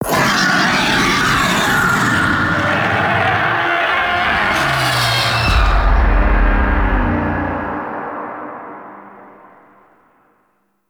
Ropalolyst Vocal Death; Warframe; Teralyst

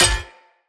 Hammer Impact Parry; Weapon Impacts; Player; Combat

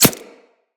Arrow Impact Flesh; Weapons; Bullet Impacts

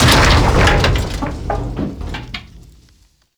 Ship Hull Impact Large; Hull Hit Effects; Warframe; RailJack

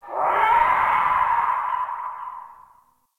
Landscape Distant Creatures

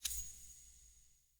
UI Default Theme Select Confirm

